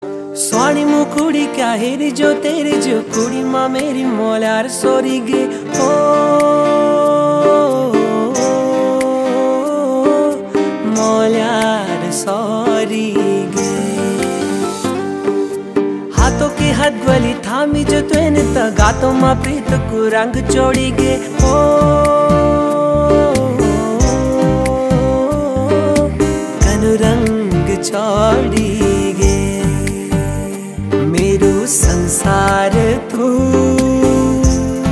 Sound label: garhwali song; uttarakhandi